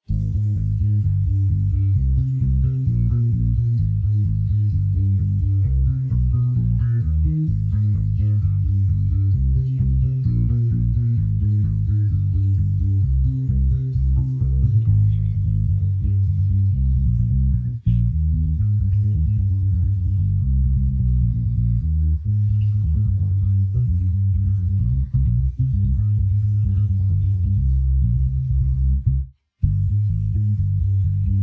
bass

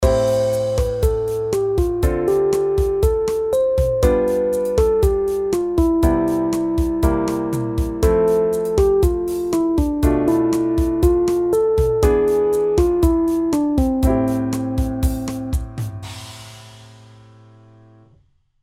fly

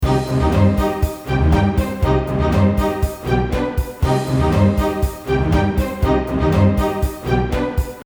strings

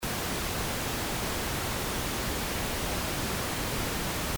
pink noise

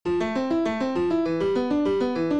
Arp

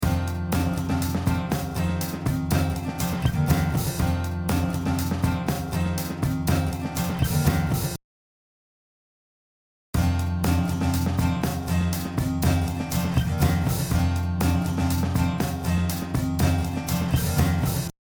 double track

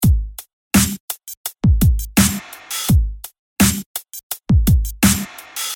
gate

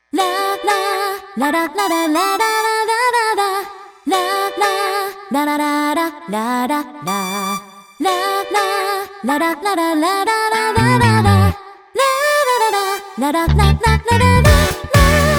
Melody Syncro

